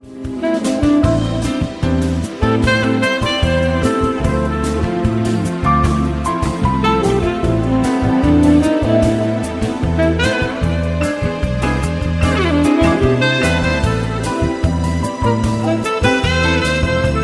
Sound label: chillout music